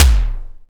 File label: LO TOM F2; Greytsounds VOL-1; SDX TOMS